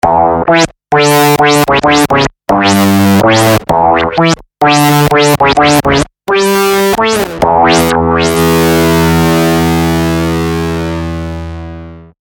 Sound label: EHX MS Square + Filter Sweep